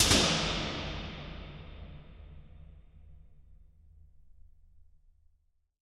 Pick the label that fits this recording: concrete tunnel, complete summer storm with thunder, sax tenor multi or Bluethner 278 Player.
concrete tunnel